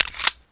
mak mag out, weapons

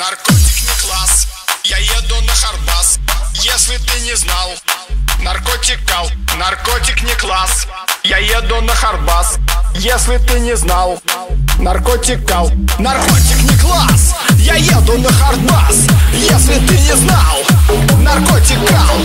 hardbass

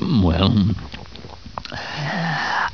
barnacle alert

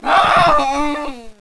death

